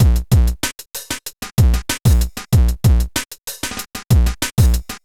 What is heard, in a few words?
kit; Sound Effect Library